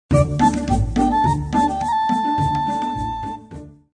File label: Jungle Bamboo Flute Melodies